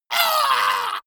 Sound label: monster hit high; schreie